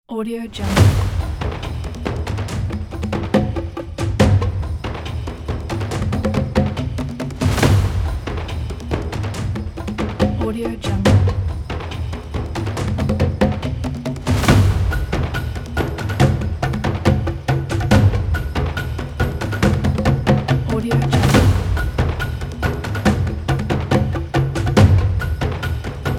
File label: epic tribal drum